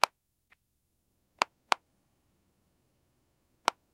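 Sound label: clean tactile button click; audible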